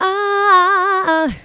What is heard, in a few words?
vox; TRUTH-HZ; Crowdsourced Sample Pack; Crowdsourced Live Stems